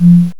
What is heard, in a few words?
Button Hover; PhrozenFlame